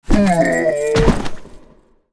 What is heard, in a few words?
hippogriph die; Npc Ship; FlyForInsanity